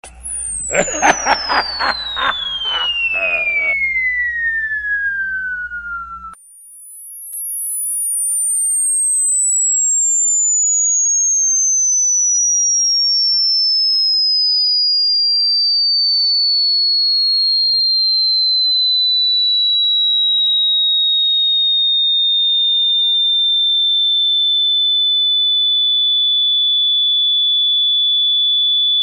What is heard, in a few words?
high pitch sound; games; ringtones